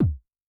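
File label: bass drum Dance, Kick, Yamaha DTXpress-II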